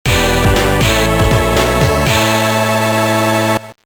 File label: Game Clear; Danny Phantom Urban Jungle; GBA